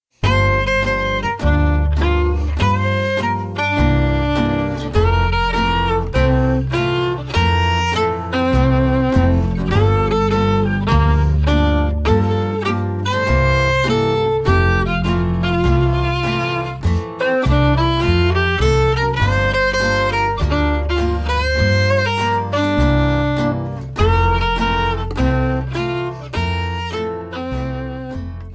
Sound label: smooth life